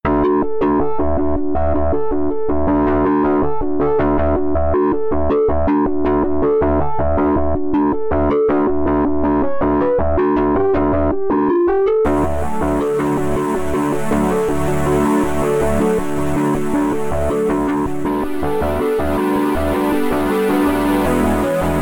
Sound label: wonky goofy guitar